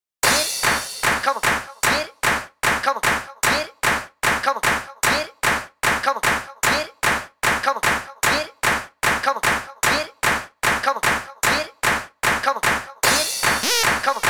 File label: Bassdrum; Bassdrum Project; vinilo